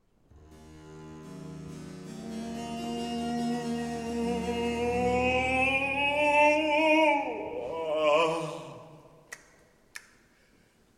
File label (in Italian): Recitativo; Uha, Che Stanco Son Di Più Cercar Costei; Polifemo